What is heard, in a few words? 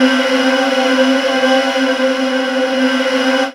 pad c, Chillout ambient Synth Pads